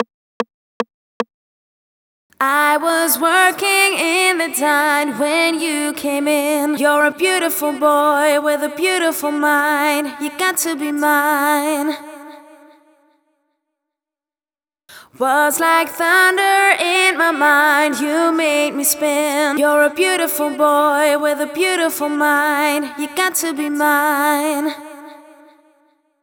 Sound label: Verse FX (Boy); Vengeance Samples